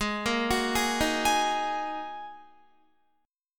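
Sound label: A flat 7th Suspended 2nd A flat Guitar, chord; arpeggiated; 16,13,16,13,15,15; 4E,4A,5D,5G,5C,6F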